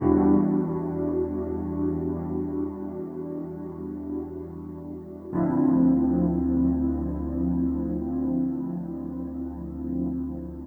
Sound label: Synth; Hip Hop Philosophy; big fish audio; Apple Loops; 090 Emin